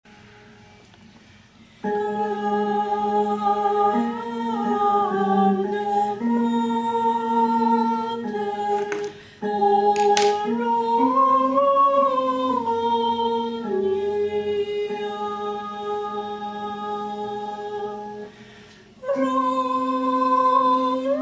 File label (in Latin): Gaude Mater